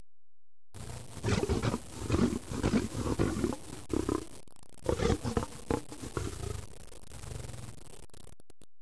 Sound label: CAT; animal sounds